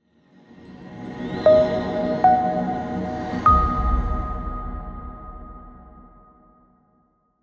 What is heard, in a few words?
Jingle